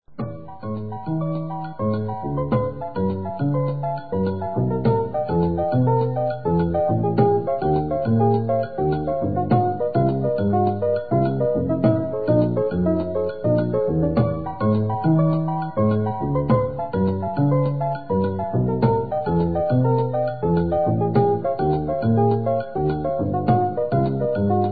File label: Descending Pattern, Piano; Piano diary 2000